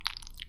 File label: Slimy, Slime; rimworld animations